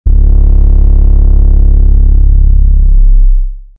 Bass Modulator Sub C

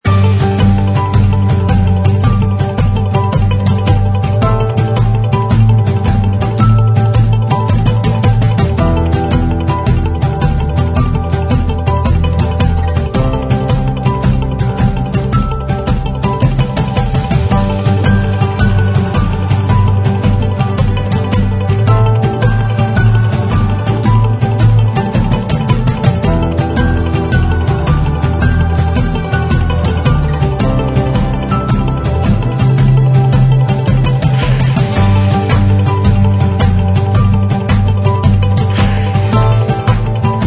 Inspiring Motivational Corporate loop

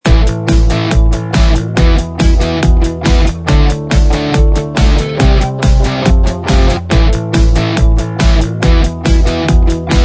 Positive Short Loop

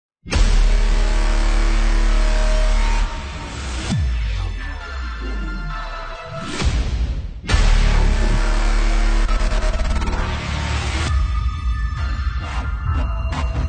Epic

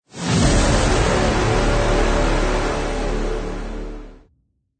Extended Intro Hit

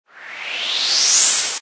Uplifter; DESFX; Zenhiser

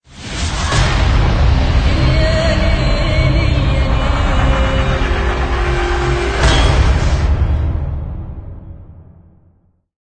Cinematic Impact Logo Arabic Vocals

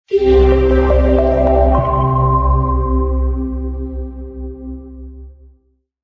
Laser Writing Logo Without SFX

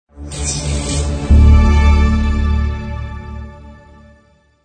Particle Logo

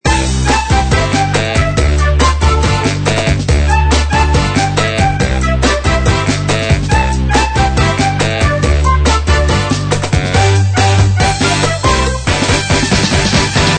Birthday Party Loop

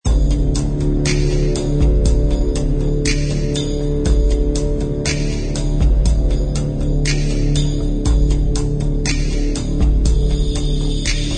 Mystical Orient

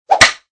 Tonfa Hit

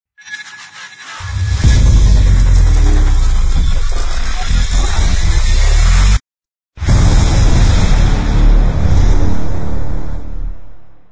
Transform Logo

